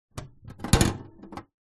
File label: animals mouse cage plastic lid hatch open; zapsplat